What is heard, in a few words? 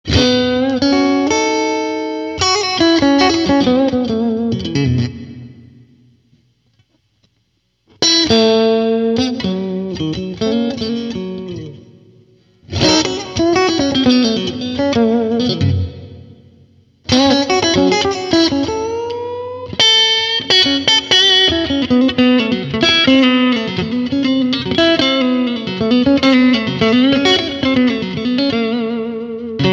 fire bottle; effectrode